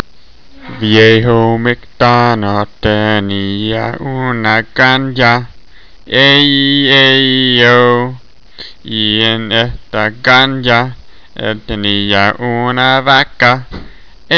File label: moo